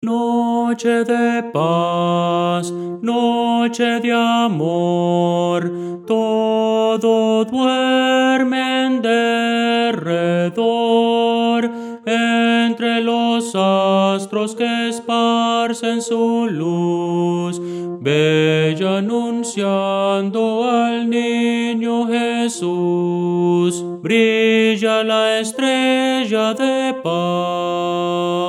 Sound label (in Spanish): tenor; noche de paz noche de amor; Voces para coro